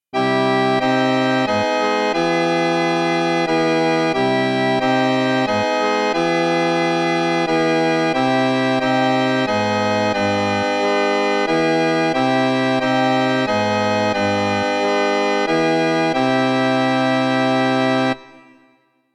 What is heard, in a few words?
pump; micro